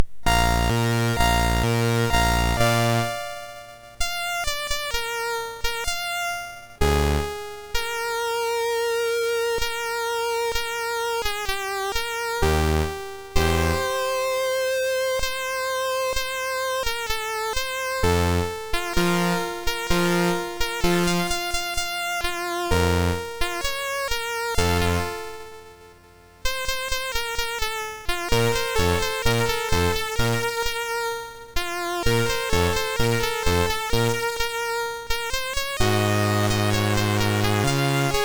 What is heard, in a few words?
Recitative and Chorus, MOS6581R2; Pirates of Penzance